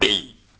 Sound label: piglette collision